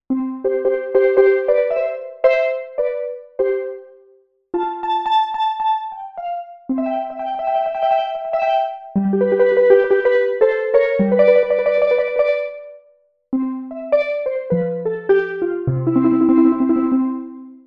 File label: Steel Drums